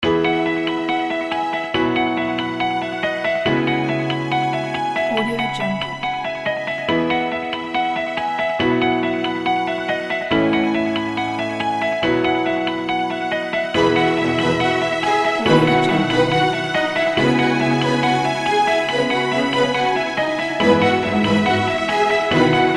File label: political campaign